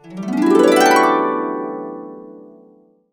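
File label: D major up, harps; Complete Harps; USB Soundscan vol.62; AKAI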